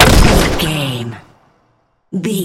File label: sci-fi weapon rifle large shot